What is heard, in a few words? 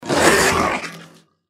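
deathclaw power attack; npc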